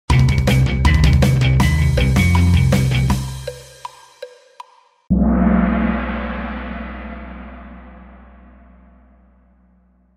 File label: In Game Music (5 Second Countdown); Kahoot